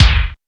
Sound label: Roland U220 Electric Set